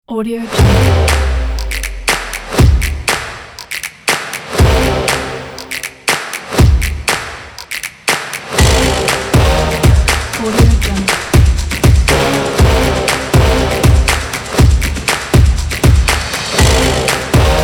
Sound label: brass and drums